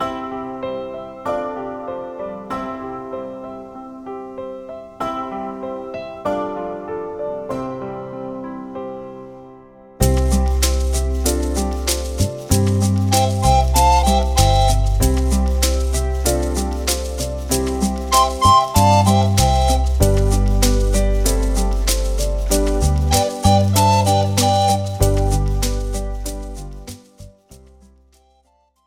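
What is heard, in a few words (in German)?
Tiefes C, Flötentöne; Meine ersten Floetentoene 2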